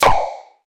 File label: cartoon gun shot light; air burst